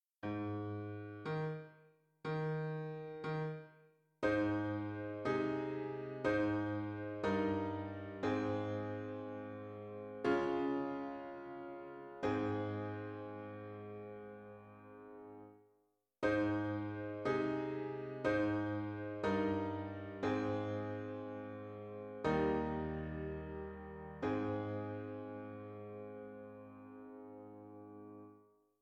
Piano

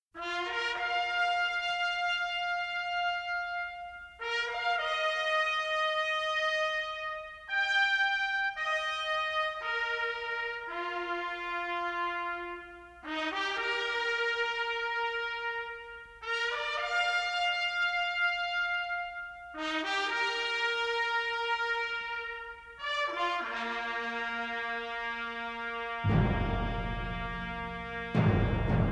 Fanfare, Maj chords

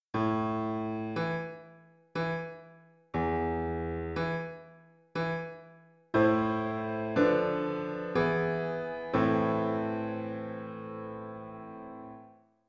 Piano

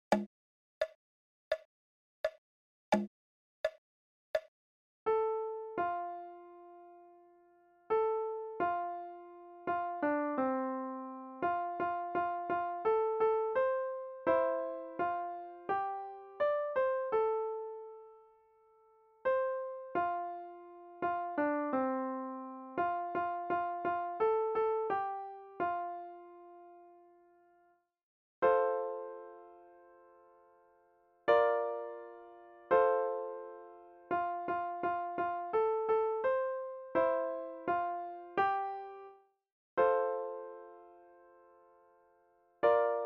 Swing Low Right

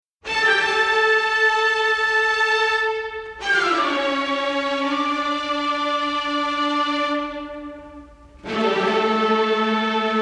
Toccata

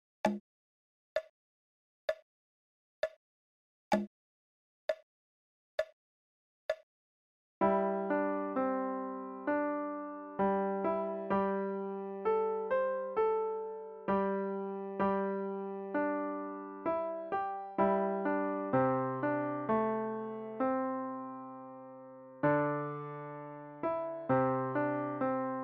Chinese Folk Song Slo

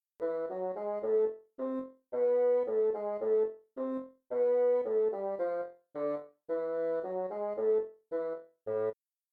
Melodic, scales Minor

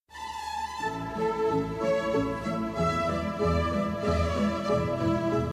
Minuet, intervals

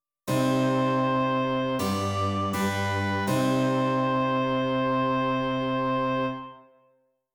continuo, dictation

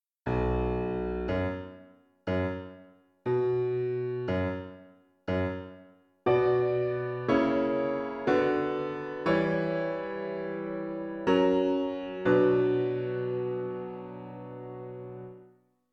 Piano

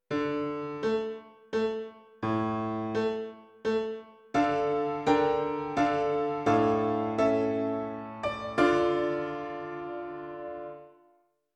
Piano

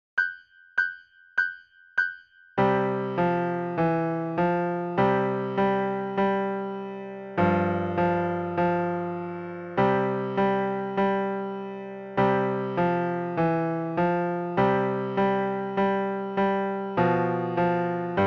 Duet

